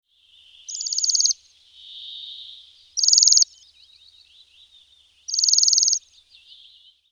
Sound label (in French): jaseur